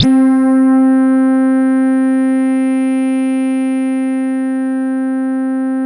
RESOTRAIL, JV-1080

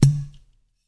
pot; Spectrasonics; Liquid Grooves; POTS + PANS

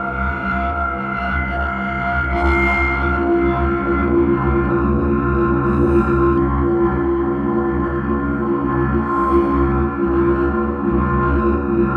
texture day of doom C Eb F, One Shot Textures; Transmission-X

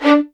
D4, LOUD VIOLINS; Q Up Arts - Denny Jaeger Master Violin Library

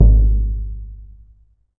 EDGE TONE, Surdo; E-MU Producer Series Vol. 2; More Studio Essentials; Composer

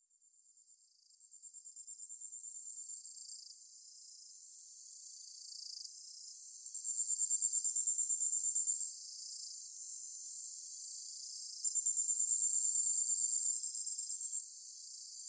summer ULTRASONIC; myNoise; ambient or nature

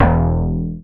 BAS FM DX-7

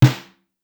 Snare, Drum Machines Clavia DDrum-AT Pitched Down

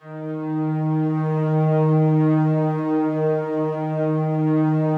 SLOW STRINGS 2 D#2 L, Yamaha VP-1; 90 s Sample CDs; Music Store

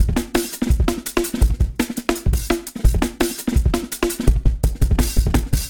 Cruise Control 168, drums loops; RoBKTA Sample Pack Supreme

